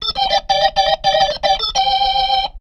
B3 LICK C; B3 PLAYED

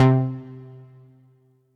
Synth C, Synth Prophet One Shots; Electronisounds

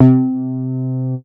MOOG BASS C4; Best Service Dream Experience

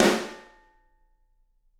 SD, Drums; Bob Clearmountain Drums I

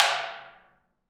Af. Hand Drm, Ambient

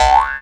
C# JAW, Primitive Instruments; Big Fish Audio - Didgeridoo and Other Primitive Instruments; JAW SIMPLE

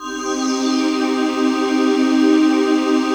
PAD, Dance Mega Synth; Best Service; 90 s Sample CDs

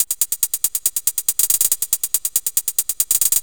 Percussive

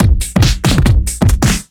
Duo Swing Mix, 140bpm; off the grid

